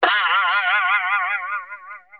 spring boing sfx

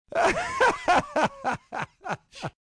cackle

